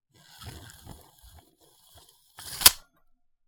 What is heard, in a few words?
measuring tape rolling in; metallic